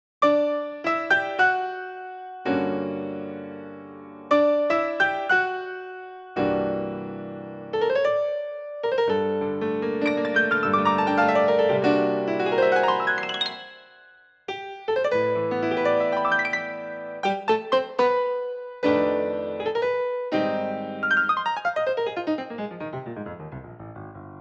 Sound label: akoustik piano boesendorfer; native instruments; the grand